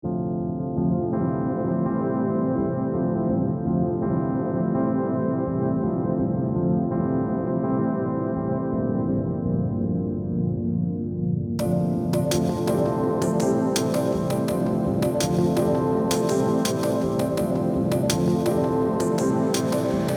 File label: Instrumental